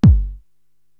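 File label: Bass Drum, Drum Machines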